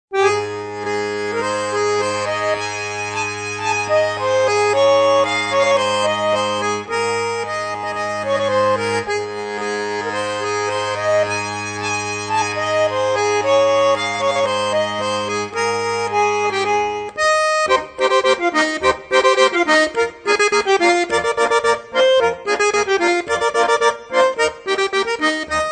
Dudelsack Schottisch

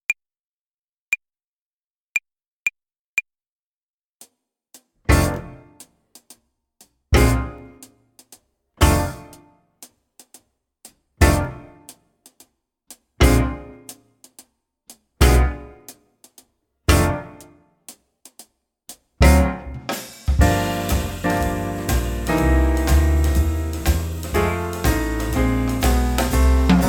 Play-along, Jazz Club Trompete

